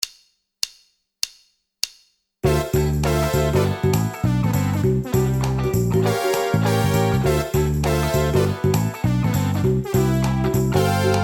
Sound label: Backing Pops